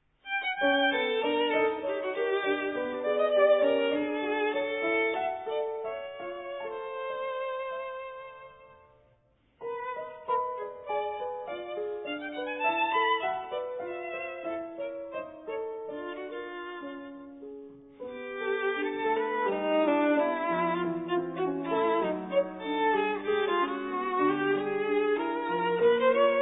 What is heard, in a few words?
violine